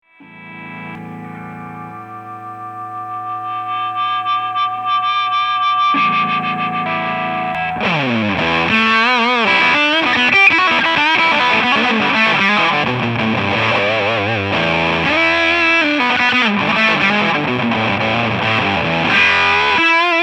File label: Express Crunch